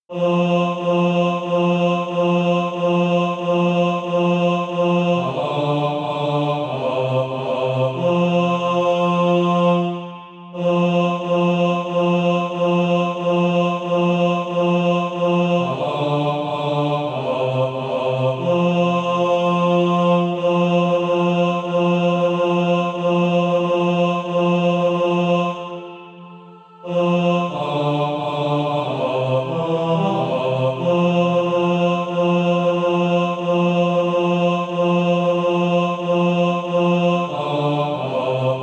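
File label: bas, Practise Music; Call for Workers Sheet Music (SATB)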